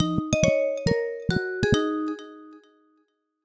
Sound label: ringtones; Motorola Edge 70 Max China; Jete